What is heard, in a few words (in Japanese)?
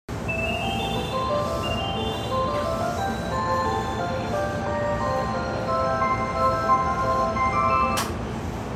京浜東北線石川町南, train sounds